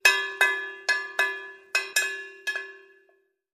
Manger Bells

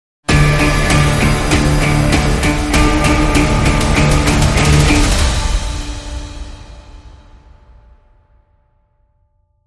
Crime Sting, Short

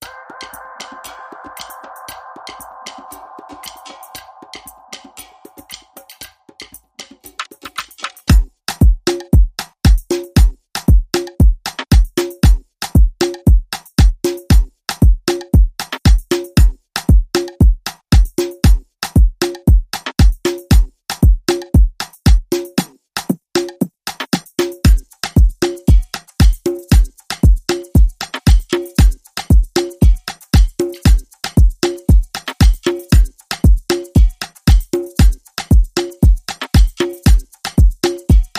Ibiza Cocktail Beat

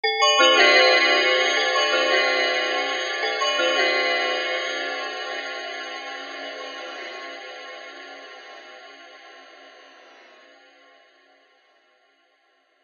Multiple Bells

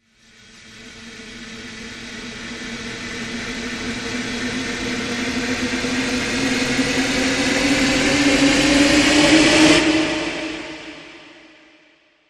Not So Simple Long Rise

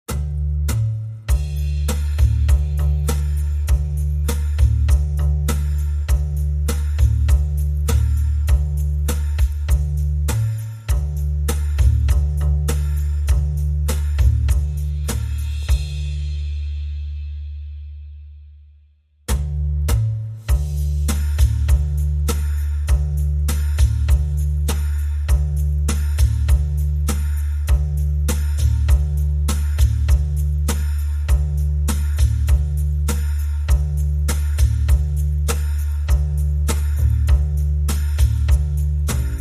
Drums and Bass

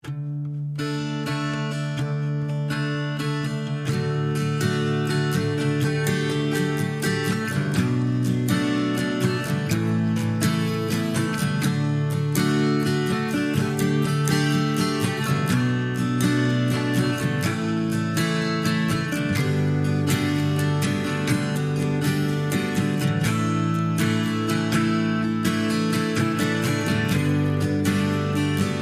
Reduced Drums and Guitar Accompaniment Only

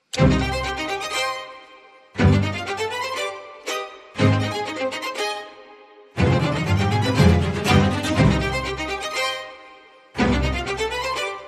Light Percussion

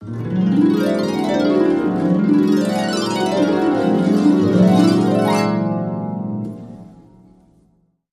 Harptastic

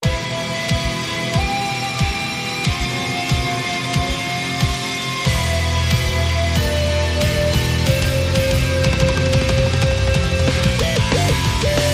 Metal Battle

